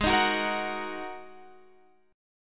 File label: Ab 7th Suspended 2nd Ab